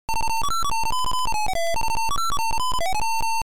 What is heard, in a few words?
Title Beeper; Back To Skool